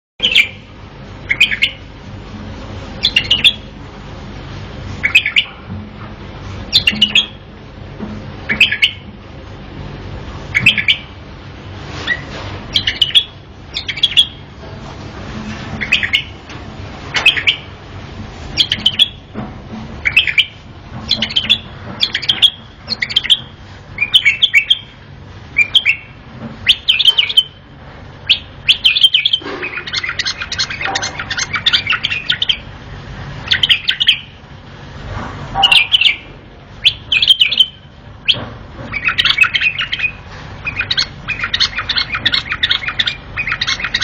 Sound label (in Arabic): تغريد بلبل